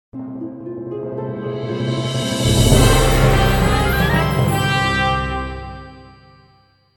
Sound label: Jingle Escape palace; Ankh